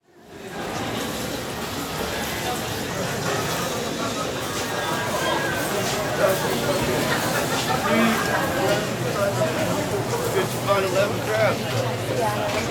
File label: Restaurant Fast Food, Walla; Sound Ideas; Walla Series